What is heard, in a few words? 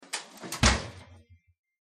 Oven Door Closes; appliances